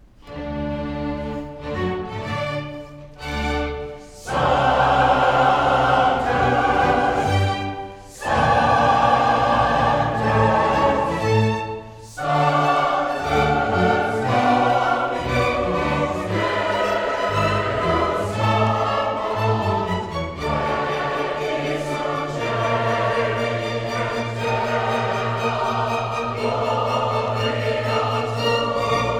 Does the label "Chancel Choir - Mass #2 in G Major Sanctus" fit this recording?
Yes